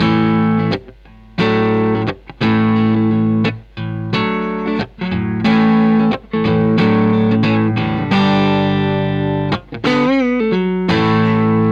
strat volume

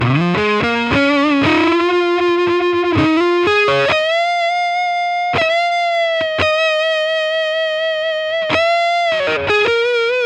lpclassic

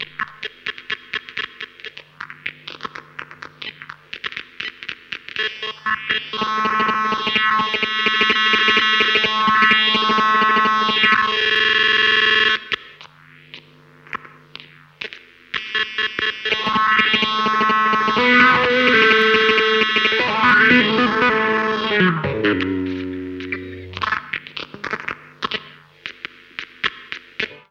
groove

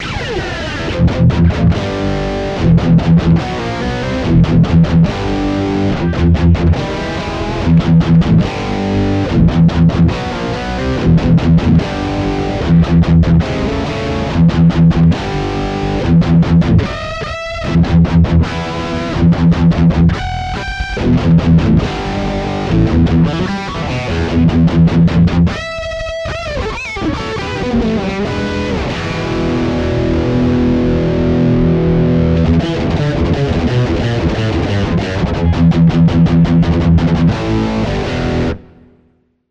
strato california higain center; gt2

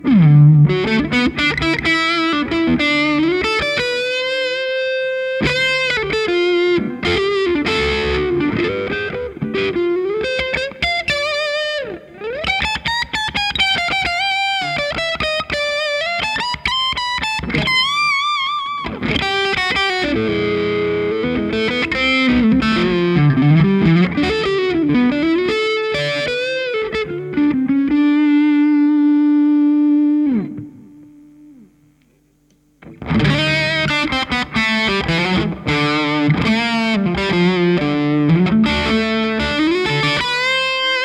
335 lead channel, peavey

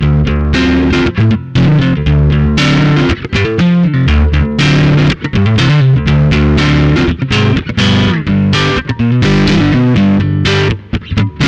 tele tweed hot center; kaula; gt2

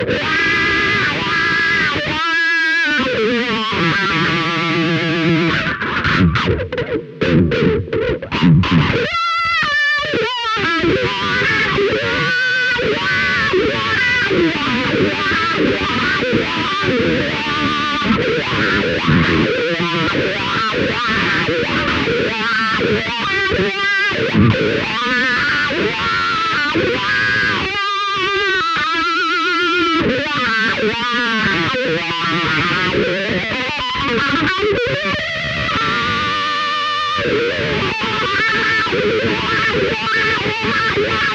vibe wah sparkledrive tubeking eq; rotovibe

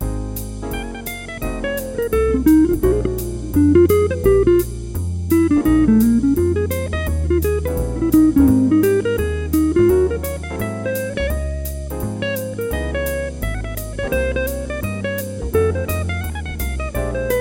170 bpm

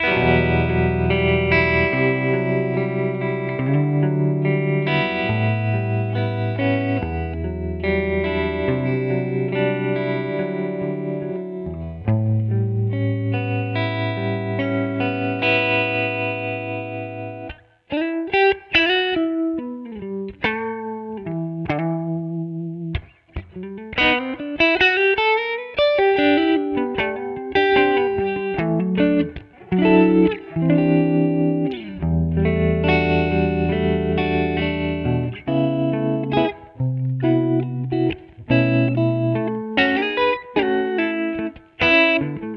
clean tremolo; pod2

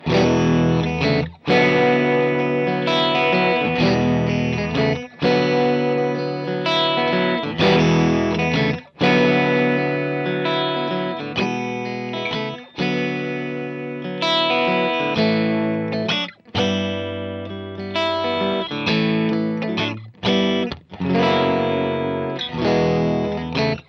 quartz, uraltone jr

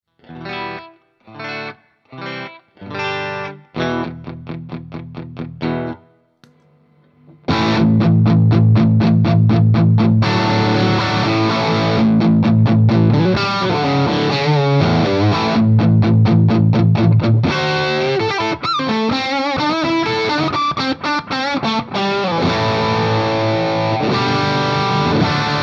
strat bridge more gain; fulltone ocd

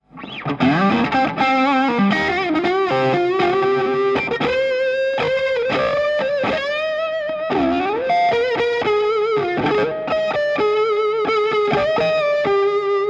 sparkledrive stratplus kaula

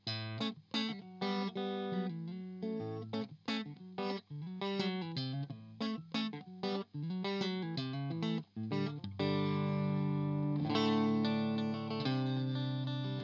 12ax7 strat neck; bad cat black cat

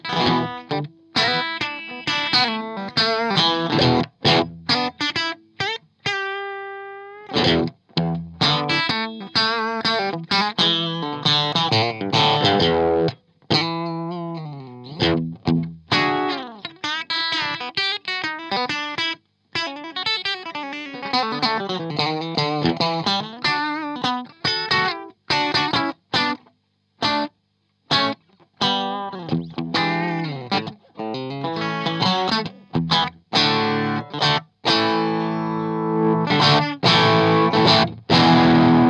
12ax7 strat bridge; bad cat black cat

